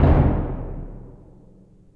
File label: B Drum; Torchlight III; PS4